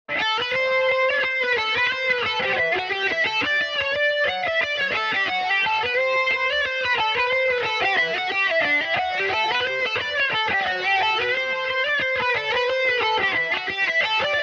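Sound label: A dorian mandobird distorted, irish mandolin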